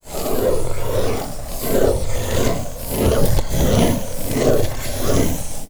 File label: recorded Loops